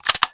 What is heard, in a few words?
uzi bolt pull; weapons